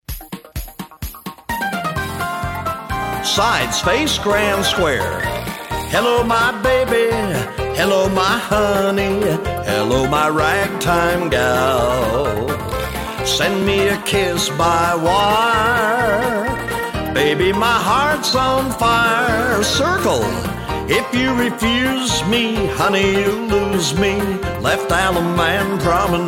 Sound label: vocal